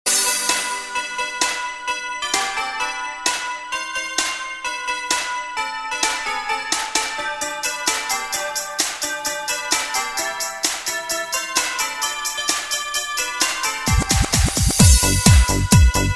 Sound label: Jingle Bells